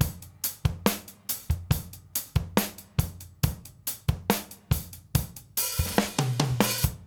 GRV 70; AMG Gota Yashiki Groove Activator

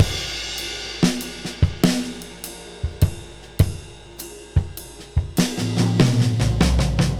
6/8 Loop, Drum Tools, Laid Back

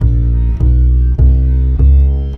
MEH2 BASS

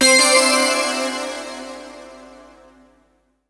VETRON C5, SYNTH; Q Up Arts; Dream Experience

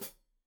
Hat Foot, Hi-Hat, Cymbals Stereo

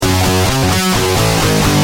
G Pump, Guitar; Industrial

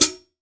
PS 2002 HH

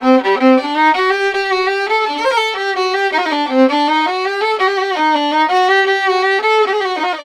VIOLIN, 132, Balkanish Traditions; USB Soundscan vol.63; AKAI

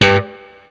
BLU POP, Guitar & Bass

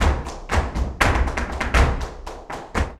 BULERIAS TAP, Flamenco; Best Service ProSamples vol.46; AKAI

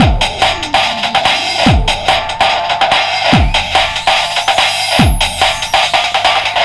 144.3 bpm; 90 s Sample CDs; Planet of the Breaks

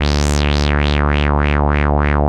WAH WAH D2; Analogon

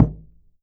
KOO KU M, KOREAN DRUMS

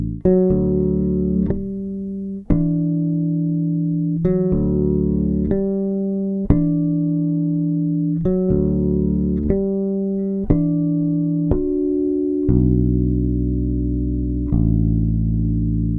Processed Electric Bass

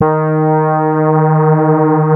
FAT LEAD, Powered DX; Wizoo

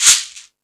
Timbale, E-MU Pro-Cussion; drum machine

